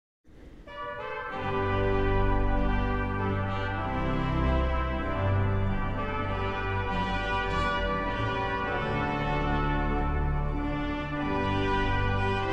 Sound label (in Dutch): koor en samenzang